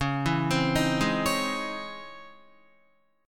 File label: Db Minor Major 9th Db down Guitar; 4E,4A,5D,5G,5C,6F; arpeggiated